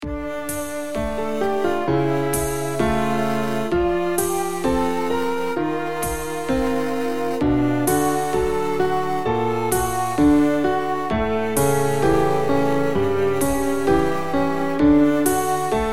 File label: instr